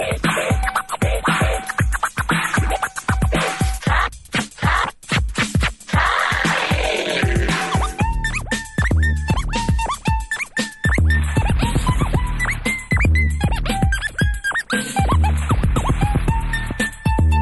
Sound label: old school scratch